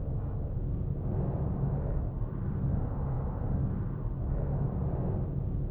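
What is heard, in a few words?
Engine Drone Exterior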